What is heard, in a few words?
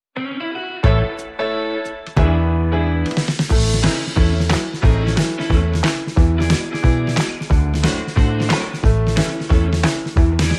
Guitar Jive Boogie, Underscore